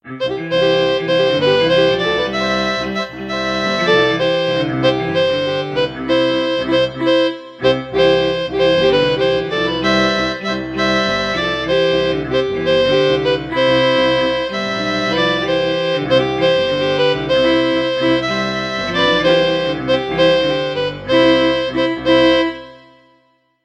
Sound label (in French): Quatuor a cordes; Gaillarde Pierre Attaingnant